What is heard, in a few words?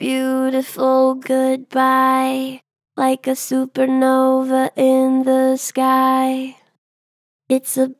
Gen Flat, Pitch Flat Gentle, Vocals; Harmor Vocals